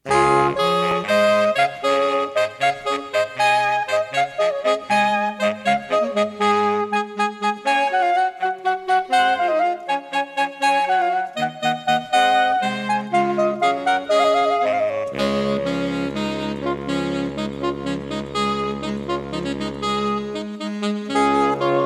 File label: Hornpipe; water music